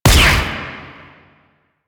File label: Pistol Silenced Shot; Weapons; Warframe; Drifter; Operators